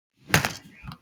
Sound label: bone crack